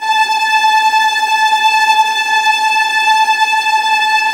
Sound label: VIOLINT B, Synclavier Strings; ILIO